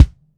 BD, Bassdrums, Roland TD-20

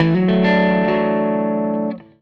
Gretsch Guitar Loop; Sonic Foundry (Sony Creative Software) - Six-String Orchestra

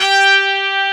TRMPS SAX G4; AKAI S-Series CD-ROM Sound Library VOL-2; TP+SAX2

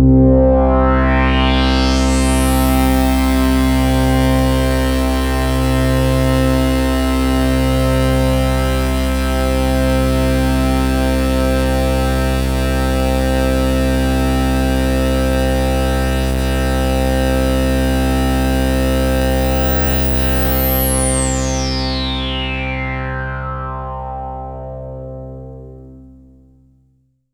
pad C2, 5U Modular; Polyend; Simulacrum Sounds; 1 Shots; Synths; Pads

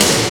snare pow; Roland L-CDX-01; Snares 5; Sn Modules 5

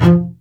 BASS F3; AKAI S-Series CD-ROM Sound Library VOL-2

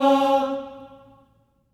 Staccato M C#4 L, Symphony of Voices; Spectrasonics

